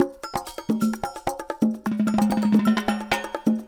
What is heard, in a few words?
Loop Percussion 130; drums pads